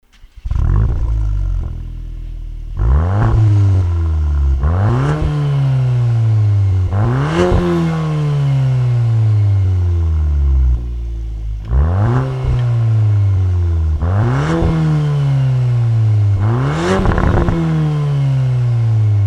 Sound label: VW Golf VIII R; remus